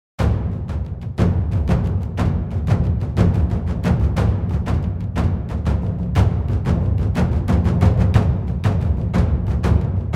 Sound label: taiko drums grooving